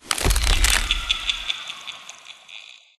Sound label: spooky treatbag use